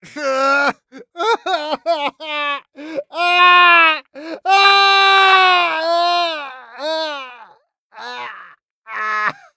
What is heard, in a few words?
agony; Escape from Tarkov; scav6